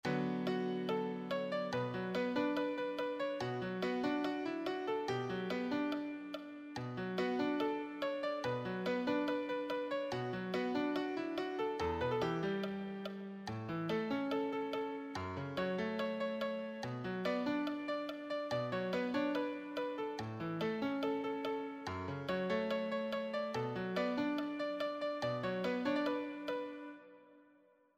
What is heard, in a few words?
solo, piano